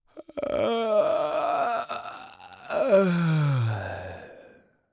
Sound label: Human Male Yawns